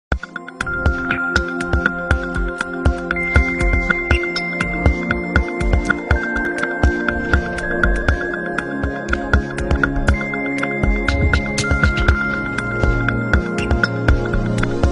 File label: nokia n900